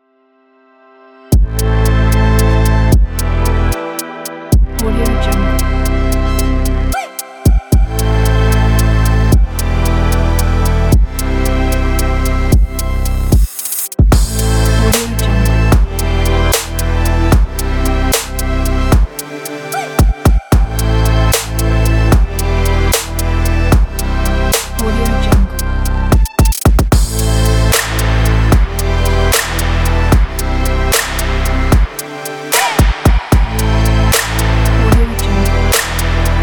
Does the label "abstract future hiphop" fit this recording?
Yes